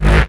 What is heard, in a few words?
Bass G#, Offbeat Bass; Vengeance - Essential Clubsounds Vol.2